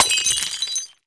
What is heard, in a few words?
glass